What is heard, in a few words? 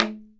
logdrum, percussion; ILIO; Synclavier Percussion 2; World & Orchestral